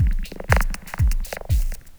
120 Dance E-Perc; Percussion; Pro Tools Essential Loops; Digidesign

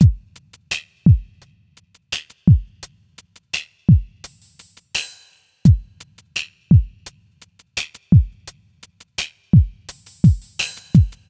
085 Pop Drumbeat; Pop Rock; Moderate Tempo; Pro Tools Essential Loops; Digidesign; Drums

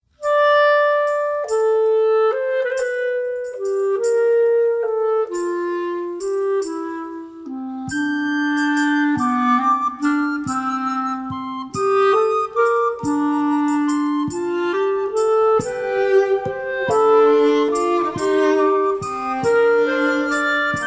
Fugue, for orchestra; Cirque du Soleil; Corteo

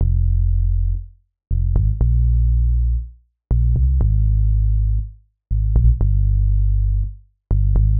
HipHop Synthbass Fmin; Digidesign; Pro Tools Essential Loops

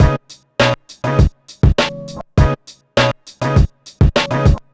HipHop Combo Db maj; Digidesign; Pro Tools Essential Loops

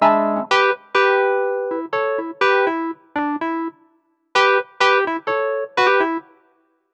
125 NuJazz Wurlitzer Emaj; E-Piano; Pro Tools Essential Loops; Digidesign

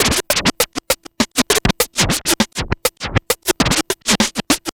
Vinyl Scratch Snare

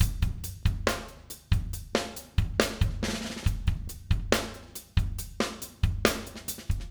070 Busy Drums Verse; Pop Rock; Slow Tempo; Digidesign; Pro Tools; Pro Tools Essential Loops

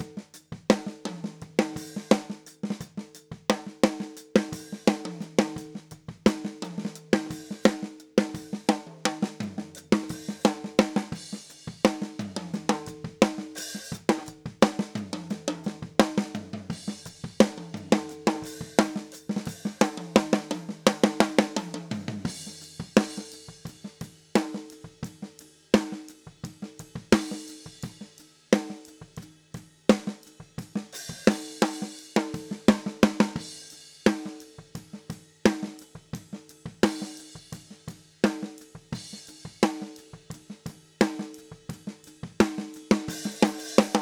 snare; Pro Tools Essential Demos; Digidesign

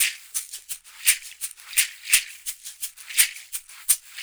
170 Dance Shaker; Dance DJ; Percussion; Shakers; Pro Tools Essential Loops; Digidesign

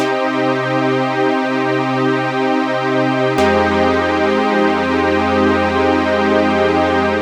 133 House Straight Pad Cmin; Dance DJ; Synth; Pro Tools Essential Loops; Digidesign

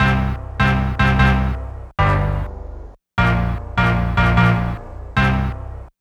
HipHop Main Blast Cmin, Synth; Digidesign; Pro Tools Essential Loops

